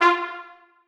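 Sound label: trumpets staccato e3; Sonatina Symphonic Orchestra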